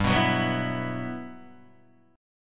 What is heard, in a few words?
G Major 13th, Guitar Open D